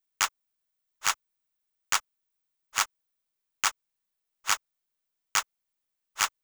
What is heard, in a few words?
drum Loop 140BPM Clap; Vengeance - Essential Clubsounds Vol.5; VEC5 Drumloops; VEC5 Multi Loops 140BPM